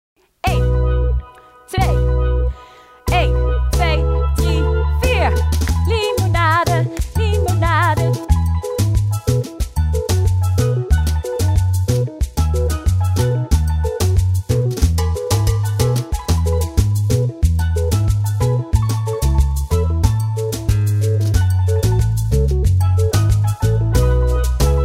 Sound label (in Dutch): Limonade Instrumentaal